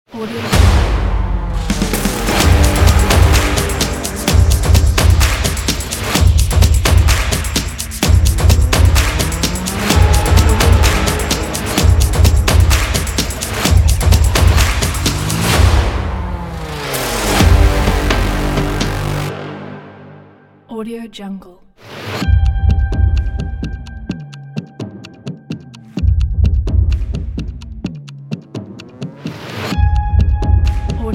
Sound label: percussion trailer action sport